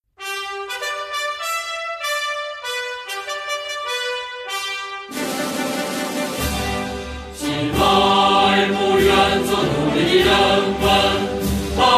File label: National Anthem